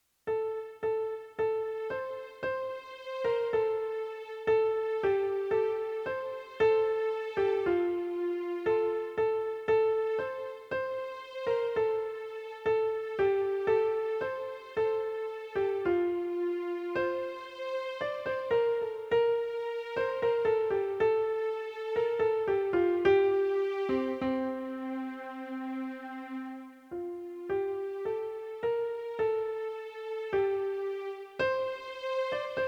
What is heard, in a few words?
Angels We Have Heard on High intro